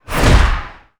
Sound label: Clout Chop